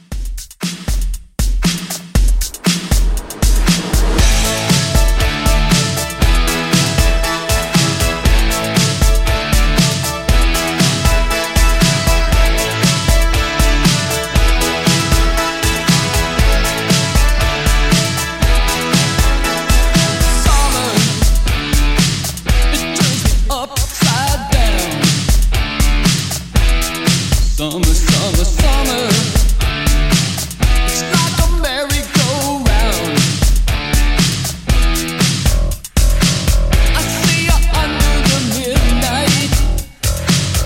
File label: 80s Pop Re Drum 118